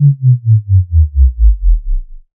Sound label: Sub Drop Basic - Gate